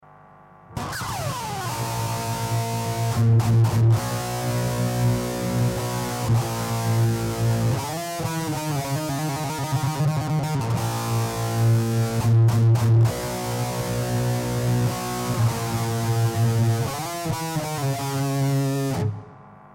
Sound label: distor